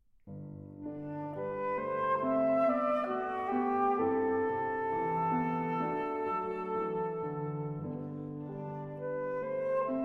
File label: Sonata For Flute and Piano, Andante Tranquillo; Undine Op. 167